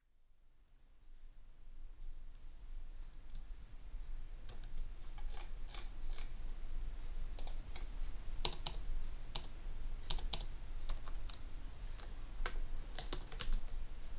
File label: typing